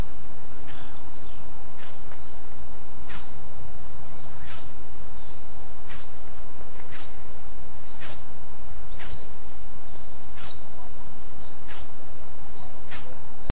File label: call, iberian chiffchaff